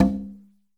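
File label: FX CONGA; AKAI S-Series CD-ROM Sound Library VOL-5; FX PERC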